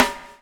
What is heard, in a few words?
BUBBLE; Spectrasonics - Backbeat